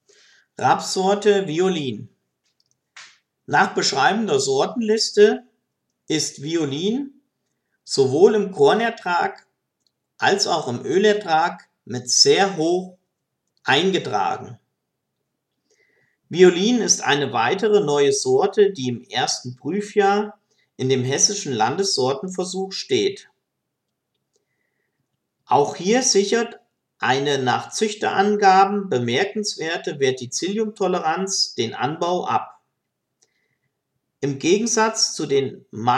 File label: Violin